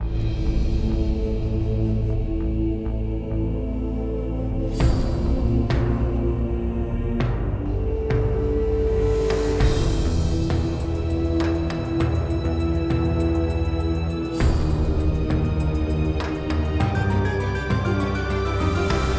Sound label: Music boss intro; Robbie Swifthand and the Orb of Mysteries; PS4